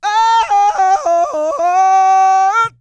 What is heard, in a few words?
SING, World Voices, CARIBBEAN; Spectrasonics Vocal Planet CD5